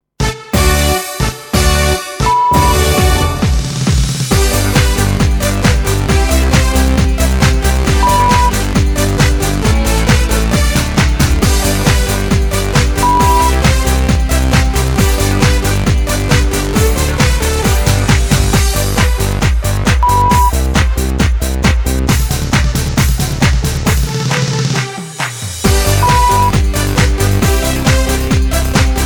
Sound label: Techno 135